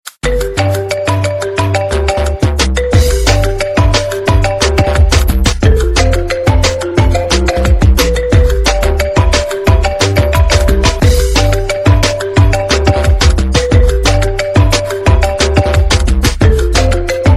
iphone marimba remix ringtone

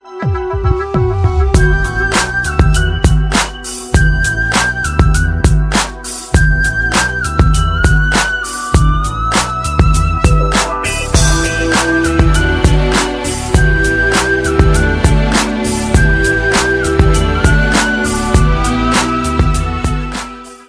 lovely flute background music ringtone

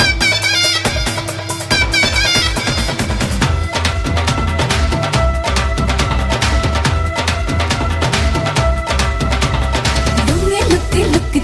ringtone bollywood ringtones

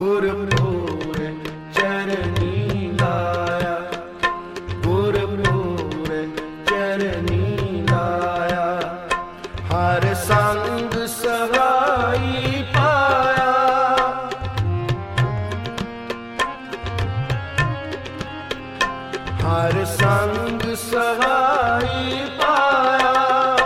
punjabi gurbani ringtone

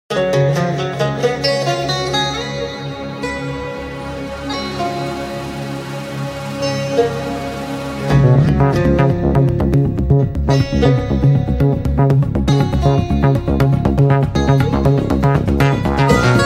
galaxy s5 call ringtone

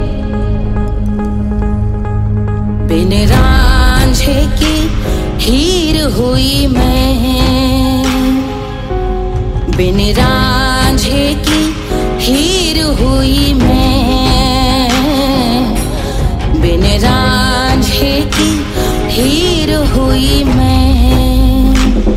ringtone bollywood ringtones

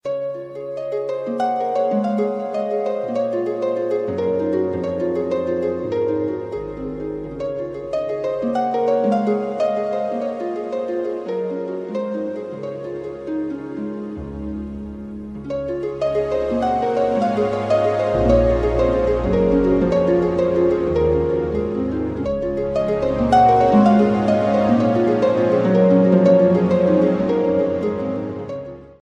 piano ringtone

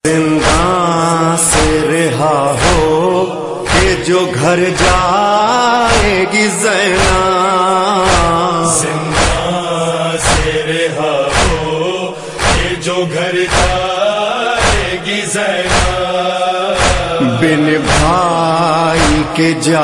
noha ringtone

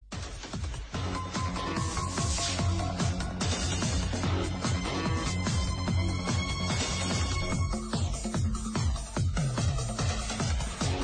theme song ringtone; alias

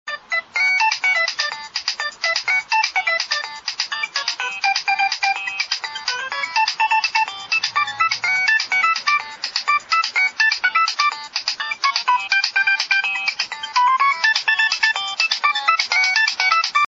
classic nokia tone ringtone

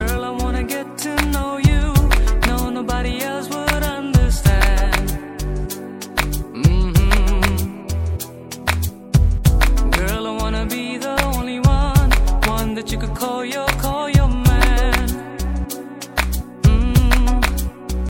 english song ringtone

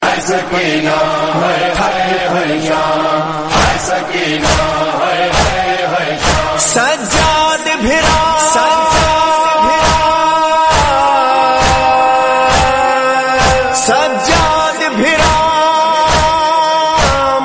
noha

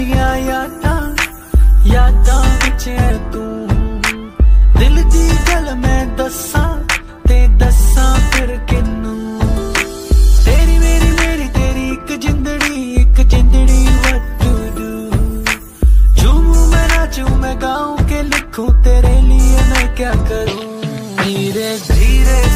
romantic bollywood ringtone; bollywood ringtones

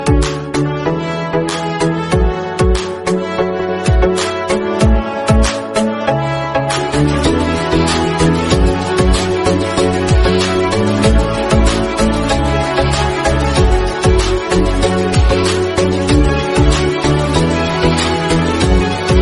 classical music tone ringtone

